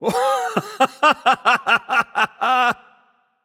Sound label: laugh; voicepack; smite; merlin